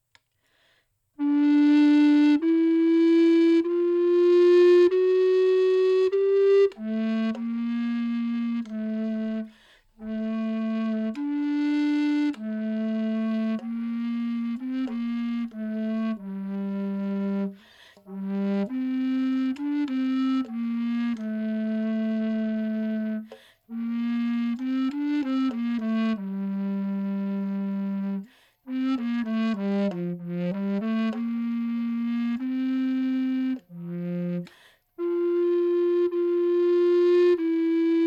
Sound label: Paisbl Bass